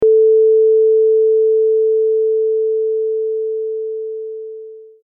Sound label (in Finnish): kompandointi